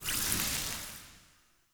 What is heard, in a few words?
Passive Sparks; Powers; Fey; Champion